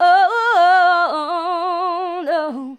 Female vocals and robo-vox

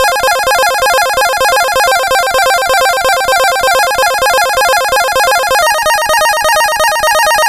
Game Boy Chord Loop, Nintendo Game Boy Synth; 8-Bit; Chiptune

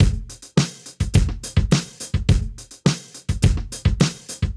Drums Proc 105 bpm, funk soul; sampled funk soul

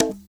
Conga; Equipped Music; Loungin House

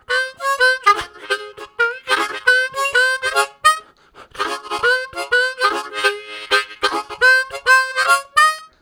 Harmonica, WAV Riffs; Zero G

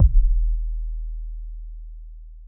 abstract kick; Dr 202 Kit 1; Percussion

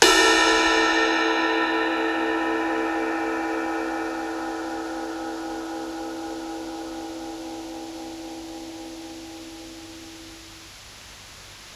Ride, Psychedelic Drums

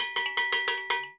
FILL, Percussion; InVision Interactive; Percussion Slam

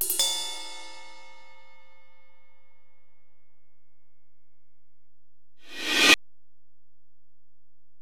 Ride, Alesis DM-PRO

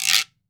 GUIRO

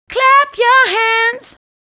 Vocal Phrases; Ultimate Vocals; Clap Your Hands

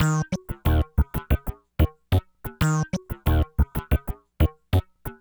Funk Riff

